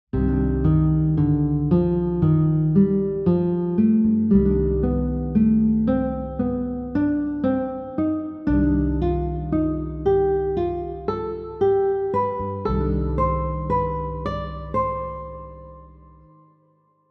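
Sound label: Ascending 3rds pattern melodic minor, guitar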